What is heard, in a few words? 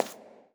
fs tile, footsteps; player; Portal 2